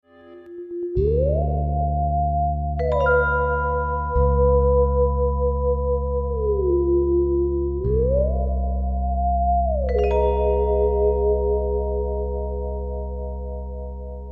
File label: martenot